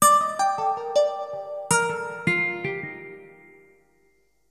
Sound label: ELECGUIT, AMBNT, 160, C; eJay; Ambient; Guitar